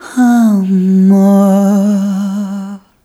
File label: Rhythmic G